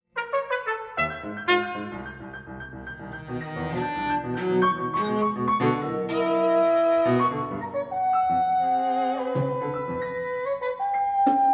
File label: double trio